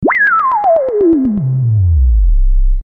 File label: fail music; PingPongPro